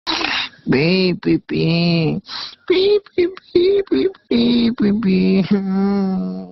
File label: pipipipipipi